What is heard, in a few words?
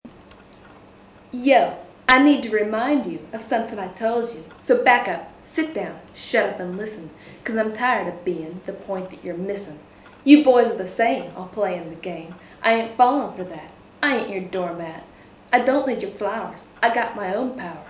beat